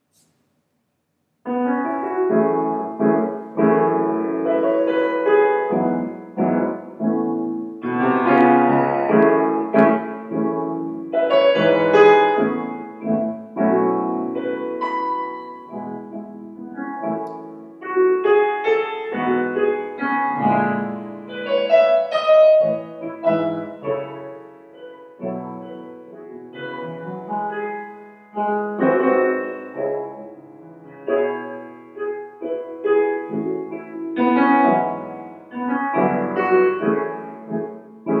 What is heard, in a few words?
shenandoah accompaniment track; kcc audition